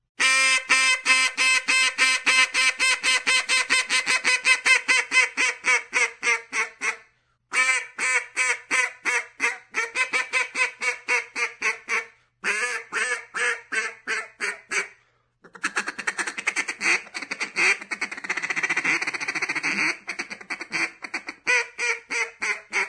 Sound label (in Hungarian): kacsa; vadhivosip